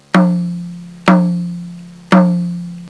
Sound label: HI INDIA, PERC